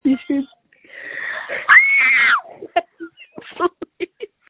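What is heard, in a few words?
screams that feature laughter; screaming into a phone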